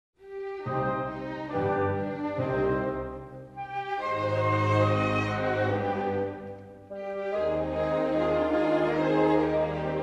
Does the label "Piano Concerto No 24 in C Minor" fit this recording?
Yes